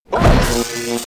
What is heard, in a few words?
war attack; Heller; Mob